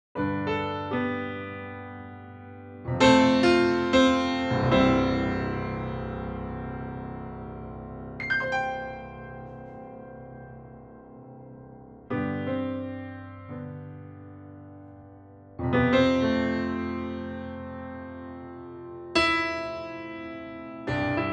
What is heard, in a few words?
Kawai Grand, piano